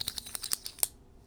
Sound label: Cable Coiler; Smol Mechanisms